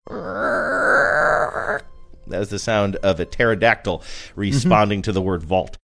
making creaking sound saying that is sound of pterodactyl